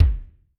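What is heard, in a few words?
bass drum stomp, Kick, Yamaha DTXpress-II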